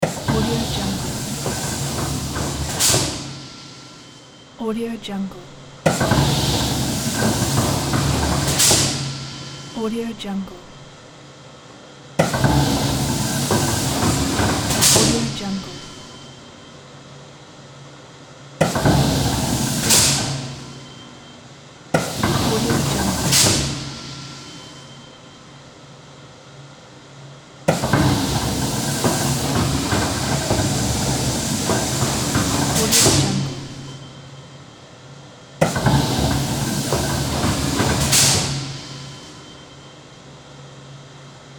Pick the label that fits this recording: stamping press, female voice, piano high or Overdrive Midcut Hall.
stamping press